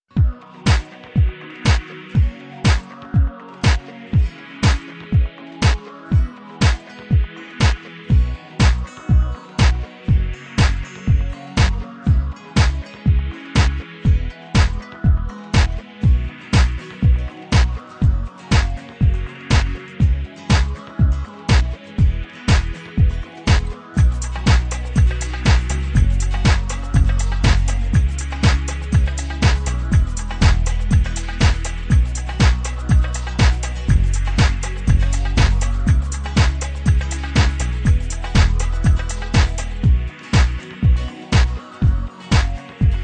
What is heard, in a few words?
menu music